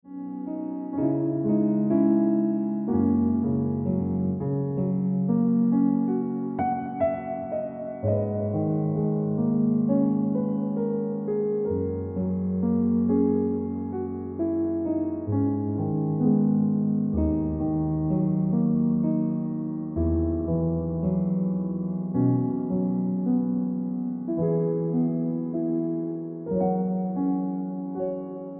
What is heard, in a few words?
Ringtone